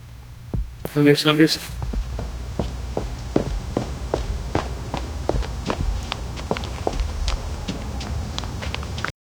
soft footsteps on pavemen; shifting

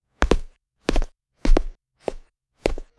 cute soft cartoon running, bouncy

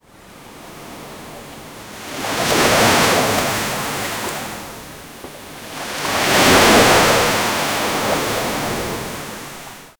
waves crashing loud splas; sea

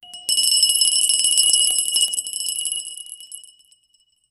crystal

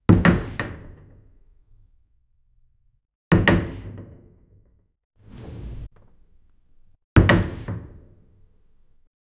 loud wooden chair hitting

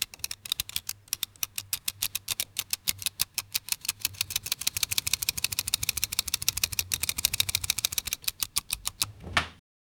factory

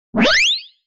the audio is a lush, sting

